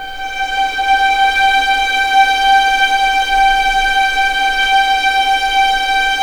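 G5 legato violin, left, The Ultimate String Collection; East West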